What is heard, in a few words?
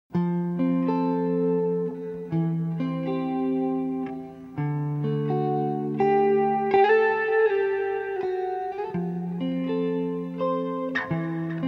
Gospel